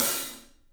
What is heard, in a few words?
PAISTE HAT, Drums; 3D Sound